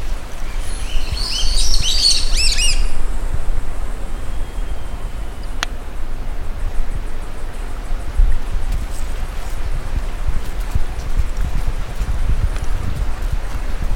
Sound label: bird